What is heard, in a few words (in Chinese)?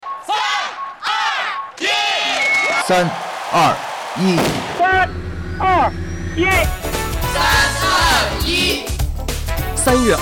电视包装音乐